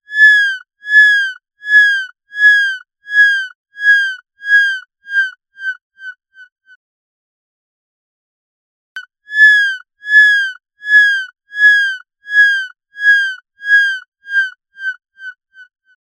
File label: Seagull; PM Flummi